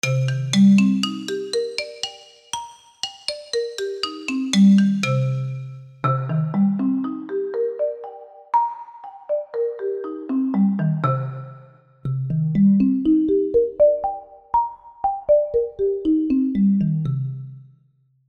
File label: marimba playalong